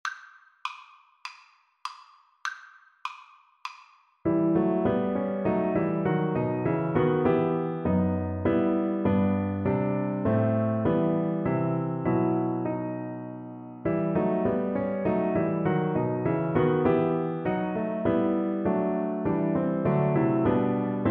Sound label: god rest, XYL; Percussion